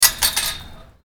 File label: fence, doors; doors creator